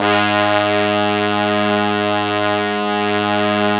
BRASS SECTN; Zero-G; Phantom Horns

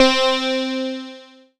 PLK OBXA C4, Oberheim OB-Xa, Synths

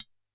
Triangle Mute, Standard Kit; Yamaha PSR-E323

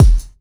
Kick, Kickdrum; Equipped Music; Loungin House

